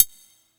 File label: Triangle Mute, Yamaha PSR E305